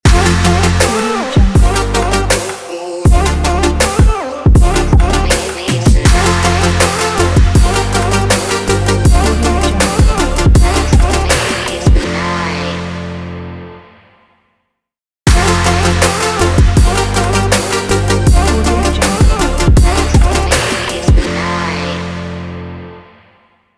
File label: future bass logo